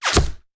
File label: Bullet Impact; Sound Ideas; Guns